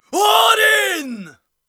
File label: Battle Cry, Viking Vocal, Un-Processed; Viking Vocal Builder; Battle Cries & Phrases